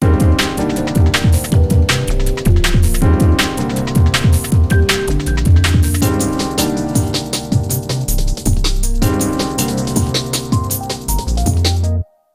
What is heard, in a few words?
Vs Result Lose